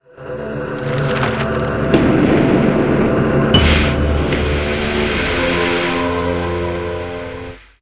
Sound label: horror photo; Stray Souls Dollhouse Story